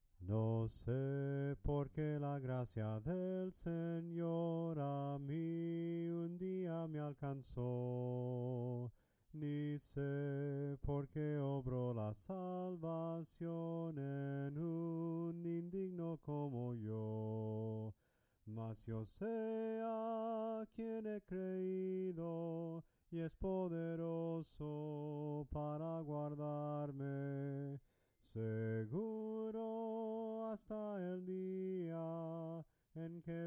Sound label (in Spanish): soprano cantado; YO SE A QUIEN HE CREIDO